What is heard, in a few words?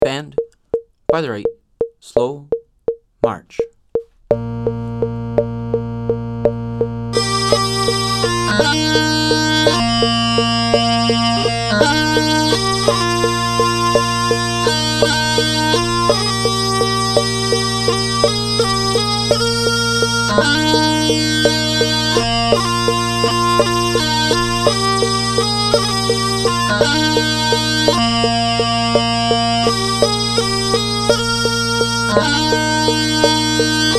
56bpm Pipe Section